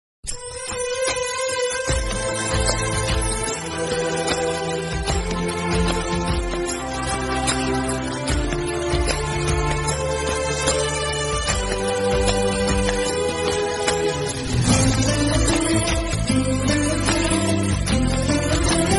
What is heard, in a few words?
bgm tamil love song